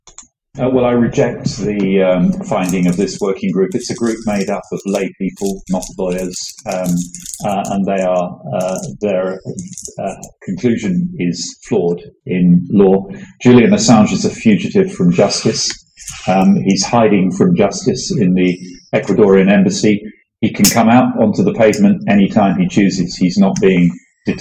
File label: Hammond